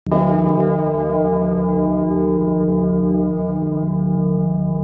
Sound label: really big bell